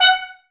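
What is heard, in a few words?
trumpets F#, brass; Miroslav Vitous; Woodwind Brass Ensemble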